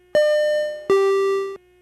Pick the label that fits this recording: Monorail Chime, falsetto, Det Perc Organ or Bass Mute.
Monorail Chime